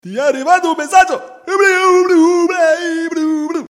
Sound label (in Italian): Arrivado messaggio